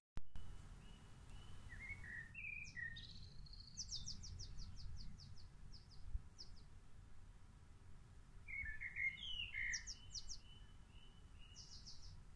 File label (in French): chant oiseaux